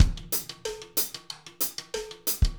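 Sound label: talking drum, drumscores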